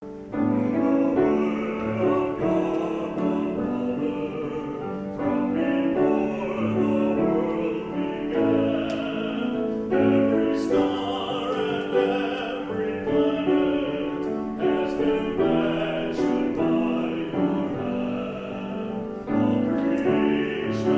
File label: soloist